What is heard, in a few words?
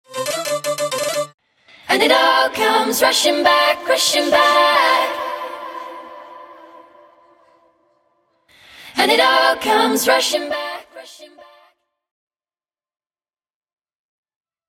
Acapella